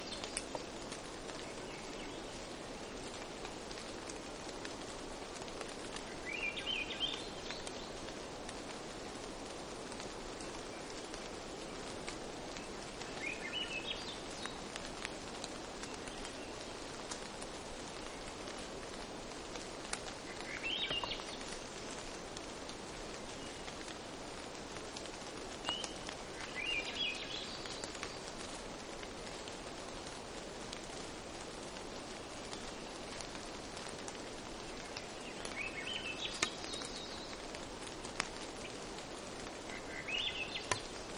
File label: Rain With Avian Chorus; alaska acoustic highlights